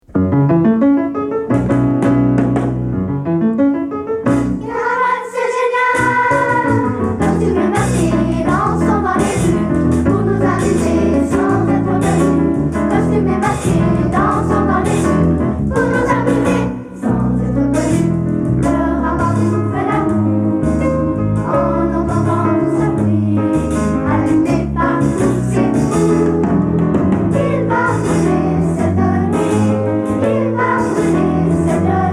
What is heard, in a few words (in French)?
Carnaval c est genial chant